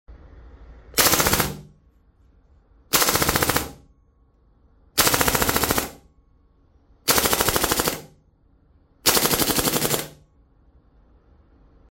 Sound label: FAMAS; اسلحه